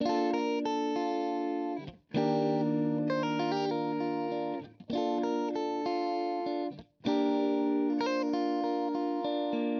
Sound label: Electric Guitar, Loops; Guitars